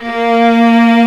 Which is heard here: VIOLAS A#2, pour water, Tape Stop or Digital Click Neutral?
VIOLAS A#2